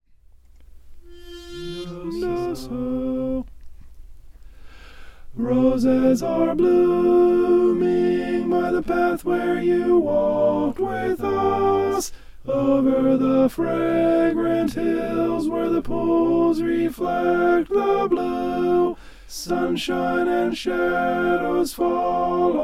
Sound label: alto